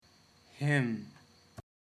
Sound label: hymn